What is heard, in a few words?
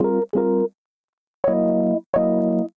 Dirty Back Bb Minor 86 bpm; MIDI Clips; Tonal; Accompaniment; Ableton; Factory Packs; Chop and Swing